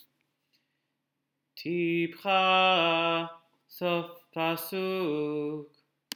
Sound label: Torah Trop Pattern